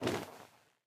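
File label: movement armor; smite; pele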